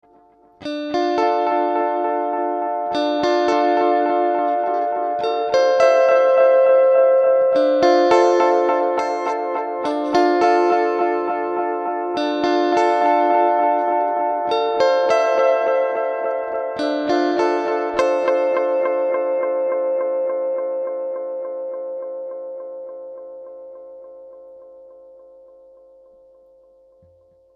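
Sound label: Guitar Vintage; ReTracer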